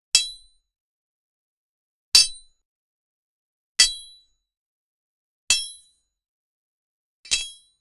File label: a light sword with like; blade